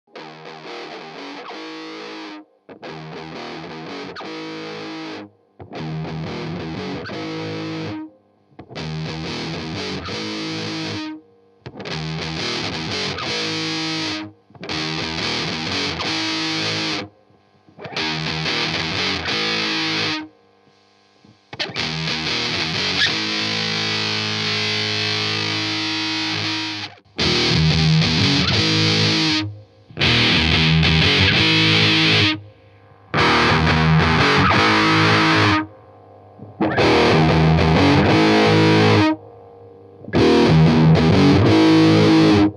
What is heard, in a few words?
metalzone sounds